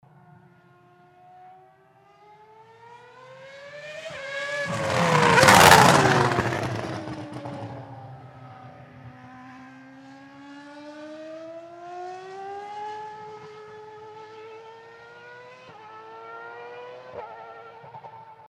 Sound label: passing fast braking, Mercedes W02 F1 Car; f1 sound